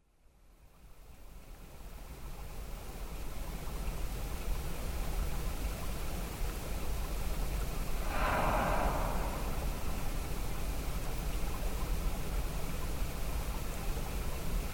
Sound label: Whale; glba